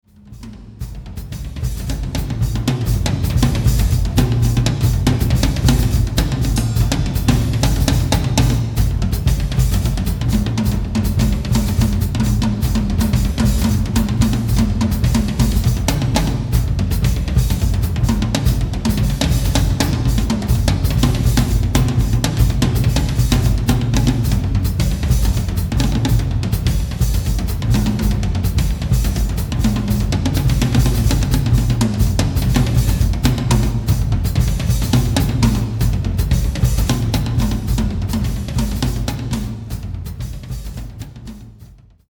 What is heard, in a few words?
rumba